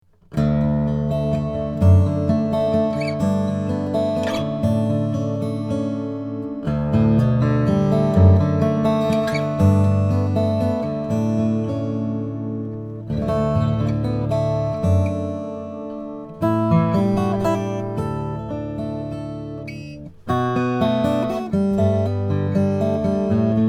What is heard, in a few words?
lowden tiger myrtle, guitar; winter 2018 ltd ed